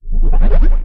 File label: Ability Charged; Kilta